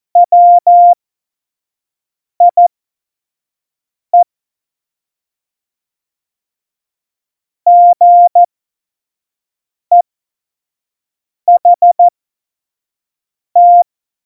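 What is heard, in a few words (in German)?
Witz, Tempo 70, Morsekurs, cw morsen; Witze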